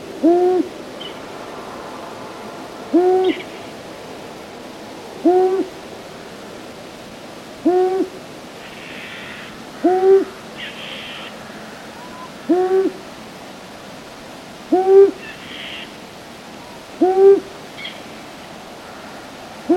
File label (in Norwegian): Ei ugle tuter; Kampen om Huldreskogen